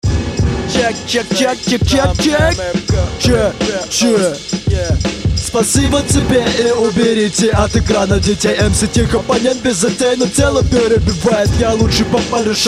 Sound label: drum streetbeat